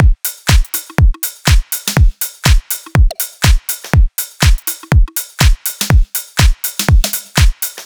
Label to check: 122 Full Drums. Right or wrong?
Right